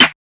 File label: Short DArk Snare; drums; hip hop; STYLE KITS (Hip Hop, Etc); Khezie Hip Hop Kit